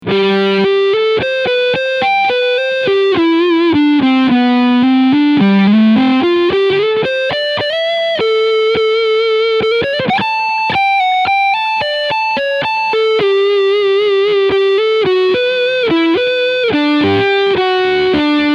Hagstrom Super Swede Limited Overdrive Front Humbucker